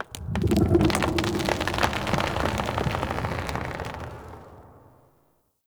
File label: Root Cave Root Movement; Ambience; One Offs; Neathuns Root Cave